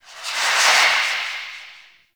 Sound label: Minigame In Range; Birth Minigame; Warframe; Jade Shadows